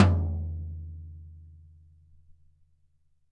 GTom, E-MU PX-7

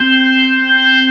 ORG C4, ORGAN B3; Best Service ProSamples vol.55 - Retro Sampler; AKAI

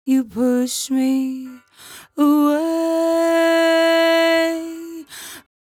57bpm Am, Velvet Vocal; Zero-G

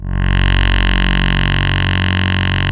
SYN Space Wave; Best Service Gigapack 1

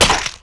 HEAD SQWISH, PERCUSSIVE

